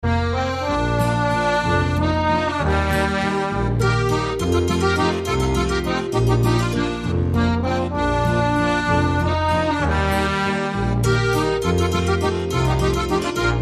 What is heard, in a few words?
Waltz